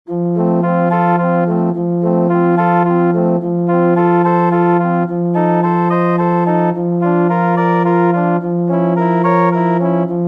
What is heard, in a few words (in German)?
Ave Maria (Viola Solo + Orgel-Playback)